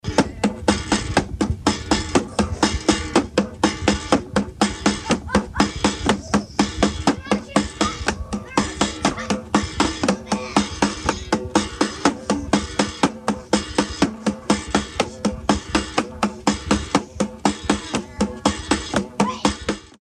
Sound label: Guitar of Africans